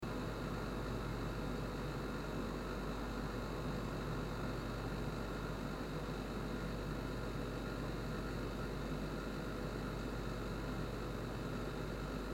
Fridge

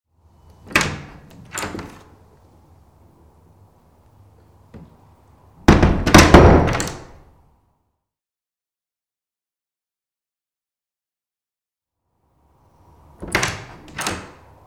Wooden Door

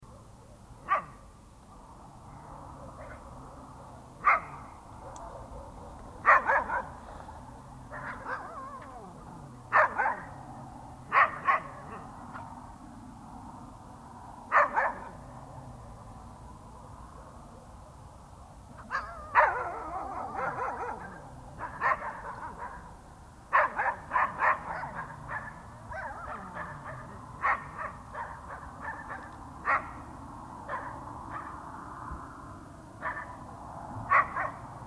Dogs Late Night

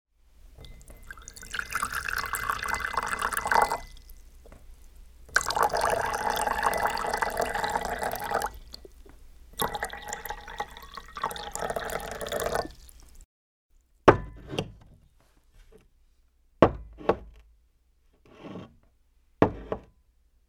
Water is poured into a glass

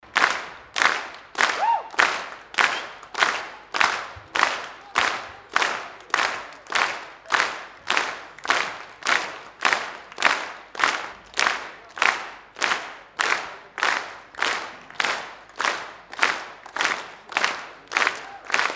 Applause

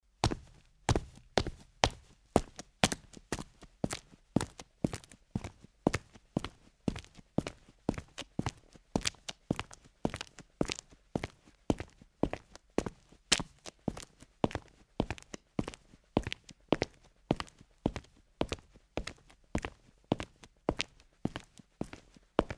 Mens steps in shoes

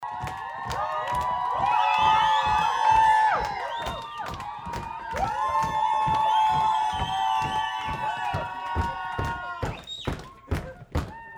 Club Crowd with cheers and dance

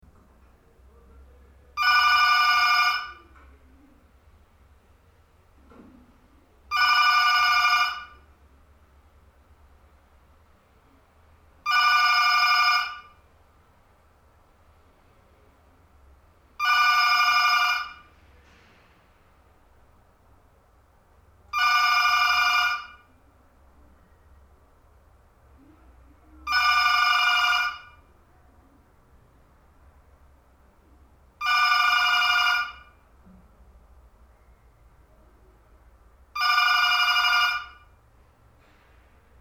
Phone call

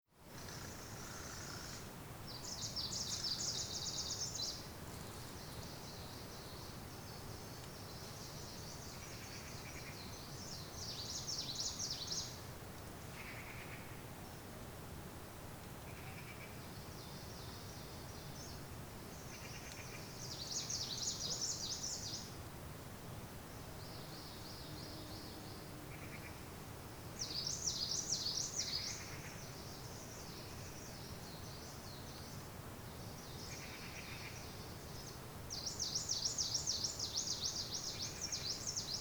Ambience of the Asian mountains with birds

